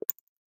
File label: swipe unlock; LG Aristo 2 PLUS X212TAL